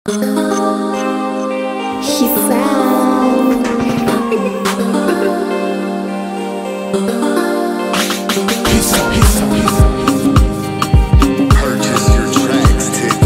Jollof High life AfroVibe Instrumental

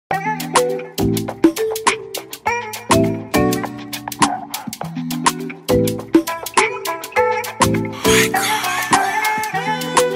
Afrobeat Instrumental

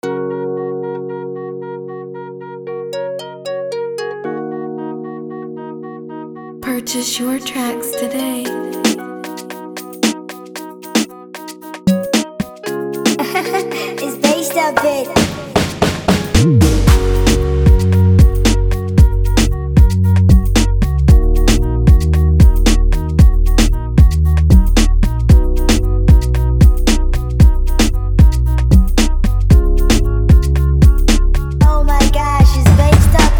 114bpm F Major

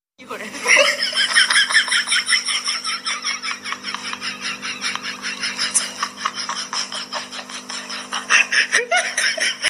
Happy laughing

